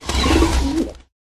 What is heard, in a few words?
Pigeon; Pet